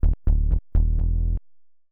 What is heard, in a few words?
SNTH BASS HOUSE 125 A; eJay